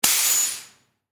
Air Compressor

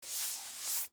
Chalkboard Erase

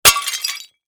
Ceramic Tile

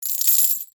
Coins Drop

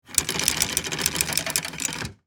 ChainPulleyPull

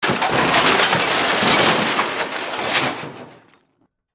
Crash Metal

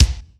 Bass Drum

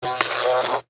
Electrical Arc

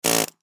Air Hammer

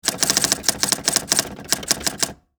Electric Typewriter

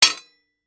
Battle Axe Strike

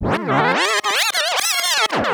Tape Rewind

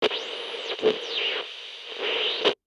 Cb Radio Static

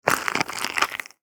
Creature Chew

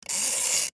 Electric Timer Turn

